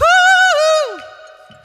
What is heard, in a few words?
woo ooh sung; ooh ahh; ho hoo woo